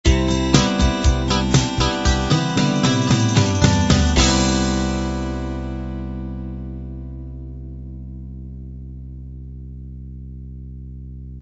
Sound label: light rock end